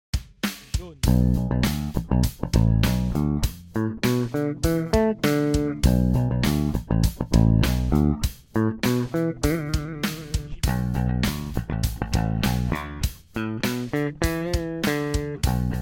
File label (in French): passif